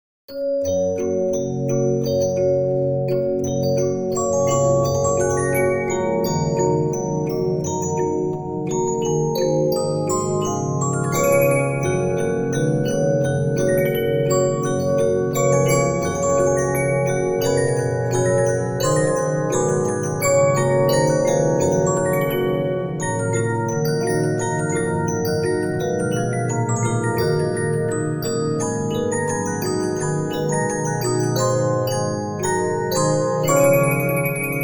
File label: Regina, 15inch disc musicbox